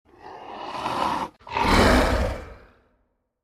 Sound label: xeno vocalize